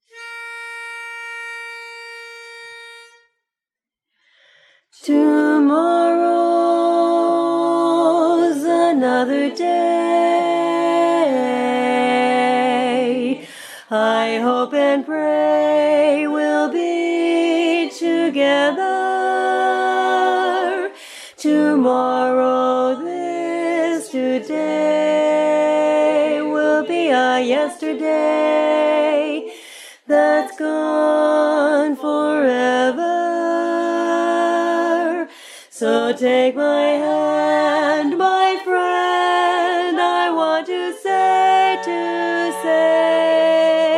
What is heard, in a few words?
Bari